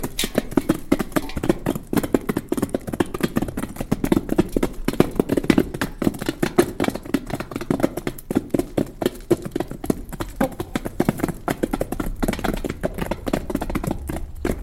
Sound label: hen scratch pan